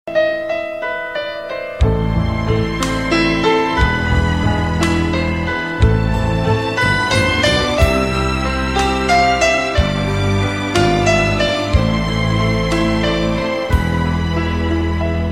tono de piano

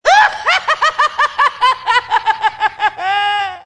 risa; el joker

